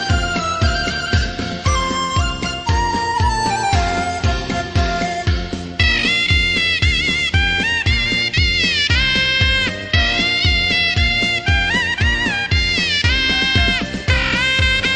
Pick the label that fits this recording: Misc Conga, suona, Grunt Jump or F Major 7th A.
suona